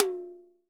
TOM H, Akai MPC2000

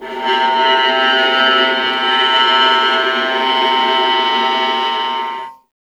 viola C; Miroslav Vitous; Solo Instruments VOL-1

